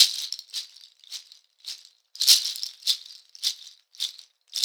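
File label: shaker, Synclavier Percussion, World & Orchestral; ILIO; Synclavier Percussion 2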